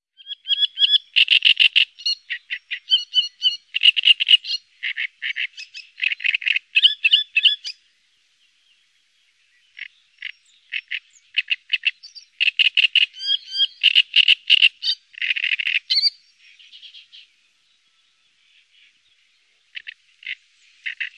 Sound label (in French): Rousserolle turdoide, oiseaux; birds